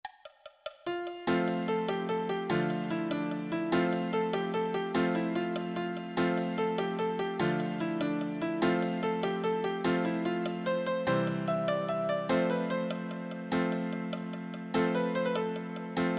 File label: easy solo, piano